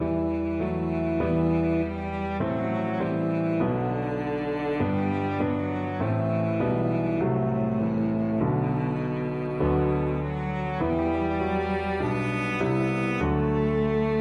cello

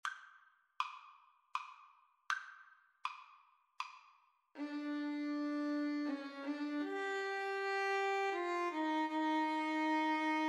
sicilian don, Violin-Cello Duet

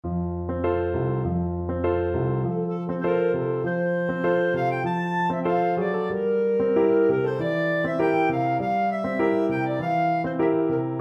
tango clarinet; albeniz

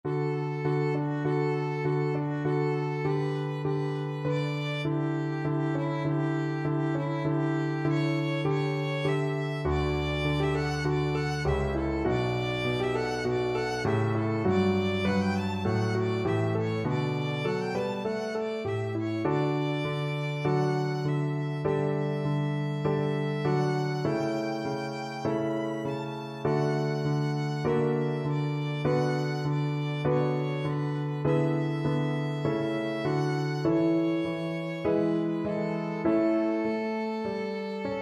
violin

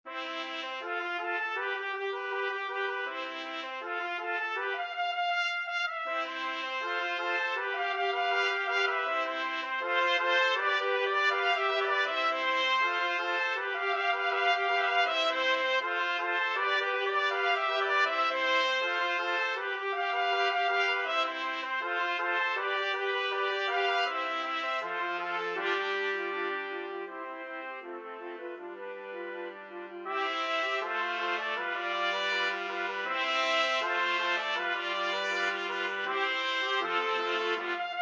la bamba, trumpet quartet

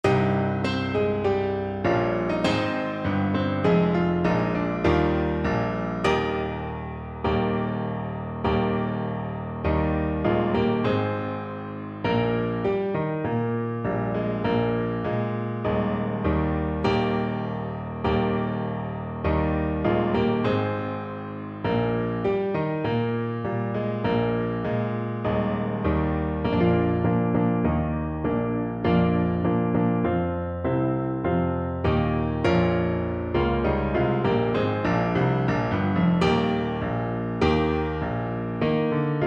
trumpet

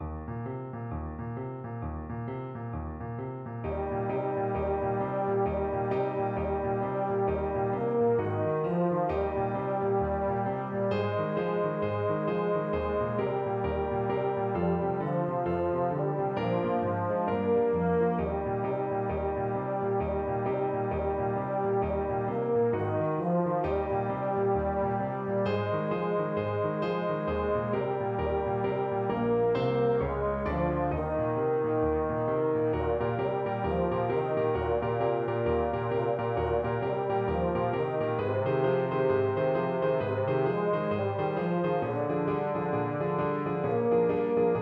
euphonium

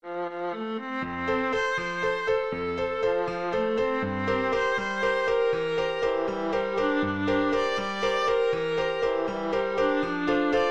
viola

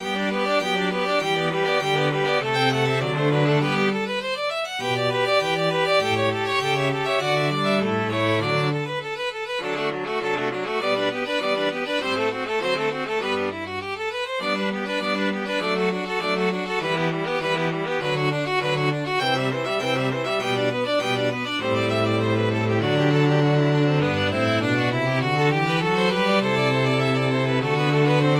queen of sheeba, string quartet

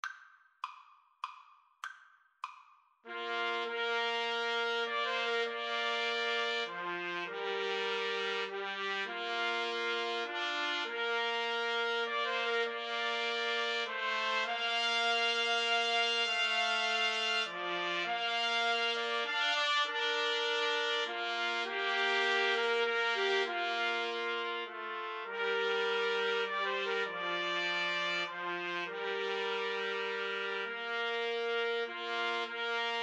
amazing grace, Trumpet Trio

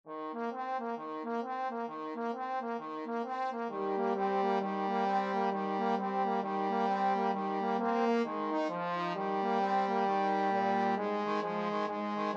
jingle bells boogie trombone duet

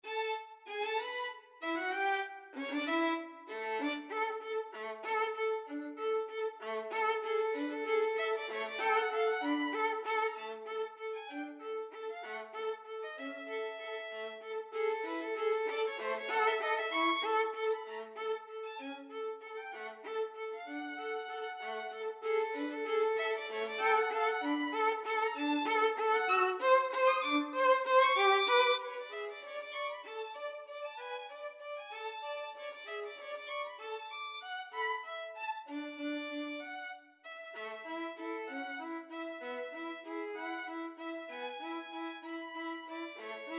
voices of spring, violin duet

